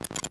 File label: Window; Falcon 40